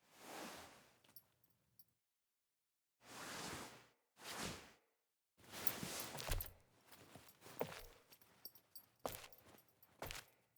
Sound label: Wake Up Tent Foley, Cinematics; Envoy